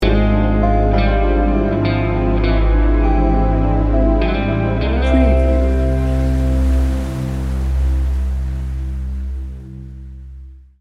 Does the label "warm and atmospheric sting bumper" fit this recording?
Yes